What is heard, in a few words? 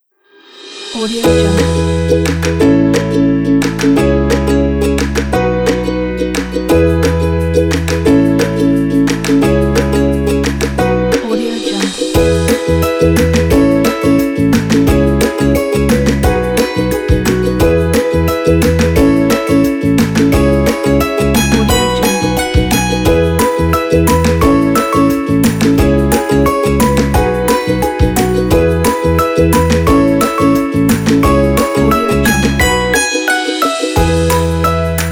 happy spring